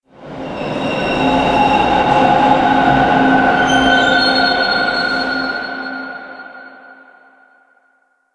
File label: Subway Misc; Warframe; NineteenNinetyNine